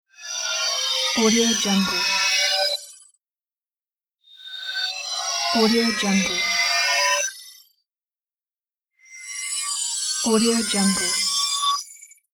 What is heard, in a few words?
quick lofi flashback